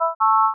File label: success; agentmag